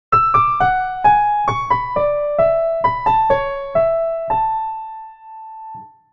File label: Nokia tune